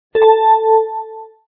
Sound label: nice chime; hopes babysitting maze